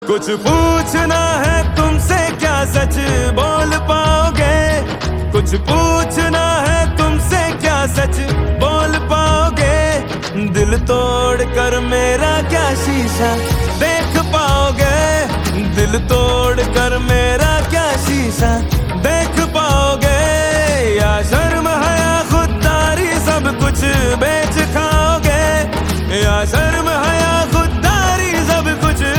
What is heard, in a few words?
Ringtone